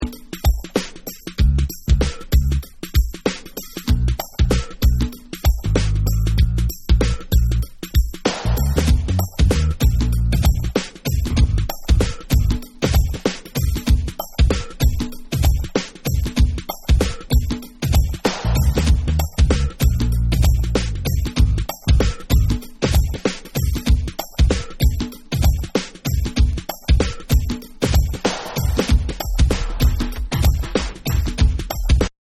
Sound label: Bonus Beats